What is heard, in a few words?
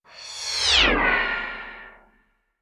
futuristic sweep pass by